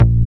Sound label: dance bass; Sound & Vision - Gigapack II CD 1